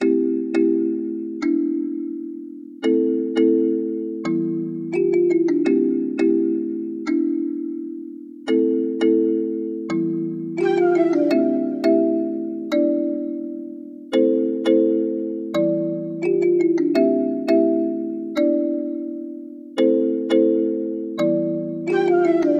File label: Pop Mallet 170bpm E minor 7